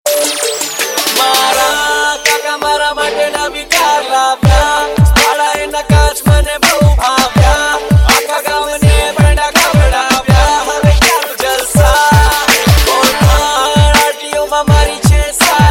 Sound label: Long Drive Music